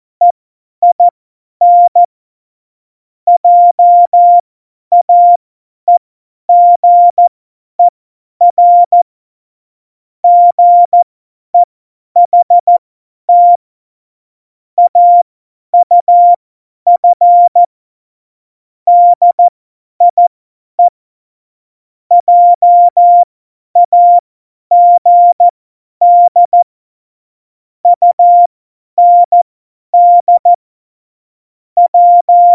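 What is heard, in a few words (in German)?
Witz, Tempo 70, Morsekurs, cw morsen; Witze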